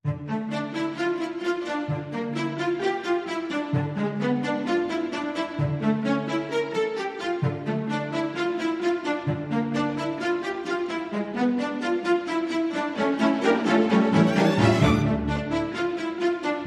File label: Strings Only